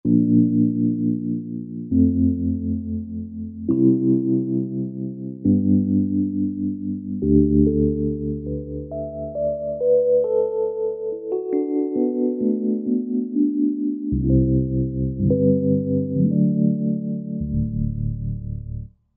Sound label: Mellow Trem; Roland SR-JV80-12 Hip Hop Collection